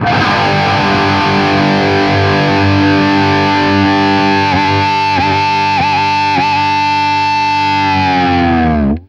POWER G, guitar power chords; East West; Steve Stevens Guitar Samples Collection

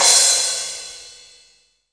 CYM

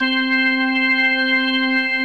ROCK ORGAN

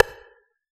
house kit rim

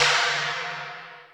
Saucers, Alesis D4 drum machine

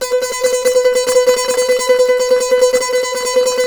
SAZ; Spectrasonics; Hans Zimmer Guitars VOL-2

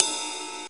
Ride Cymbal, Yamaha PS-55 drums

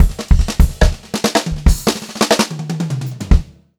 FILL, Pop-Rock Drumloops; USB Soundscan vol.37; AKAI; 90 s Sample CDs

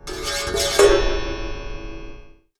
Raw, Wire & Tin, contact mic

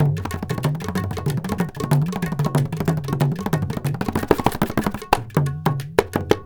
INDIA L; Spectrasonics - Heart of Asia CD2

